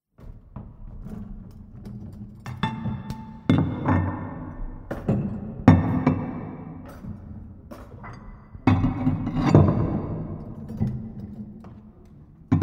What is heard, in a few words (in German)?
Geschirr; bauphysik